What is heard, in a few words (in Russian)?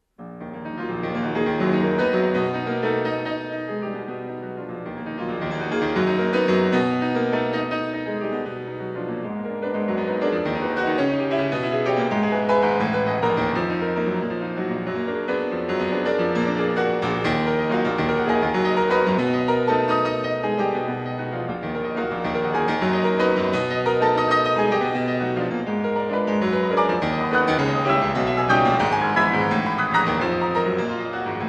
Preludii Mi-bemol minor; Skryabin; Preludii 14